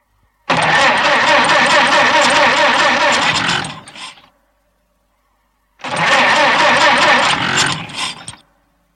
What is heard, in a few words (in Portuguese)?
carro engasgando